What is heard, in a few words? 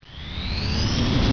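hover start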